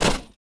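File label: footstep Dirt; droids robots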